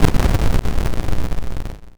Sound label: explosion crunch; kenney; sci-fi sounds